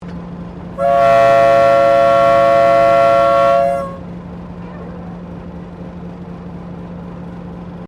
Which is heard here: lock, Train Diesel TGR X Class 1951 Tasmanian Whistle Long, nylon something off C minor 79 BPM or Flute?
Train Diesel TGR X Class 1951 Tasmanian Whistle Long